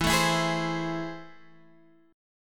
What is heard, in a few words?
A Minor Major 7th, E